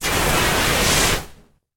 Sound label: Flame Thrower Burst